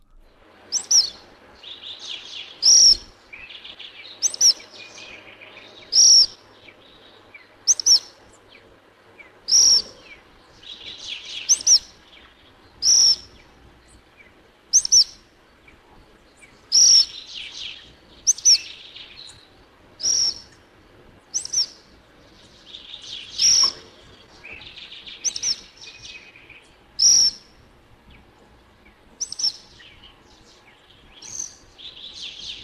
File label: Sayornis nigricans, Mosquero Negro